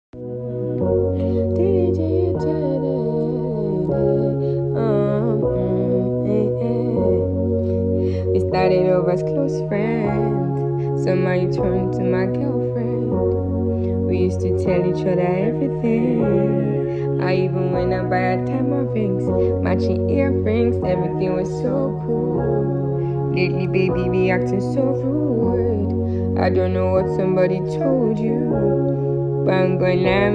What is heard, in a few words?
Acoustic Version